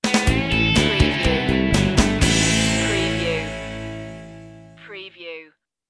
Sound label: blues rock sting